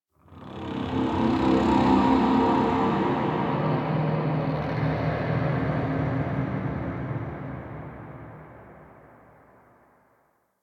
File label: Ambient Texture; Warframe; Ambience; The War Within; Golden Maw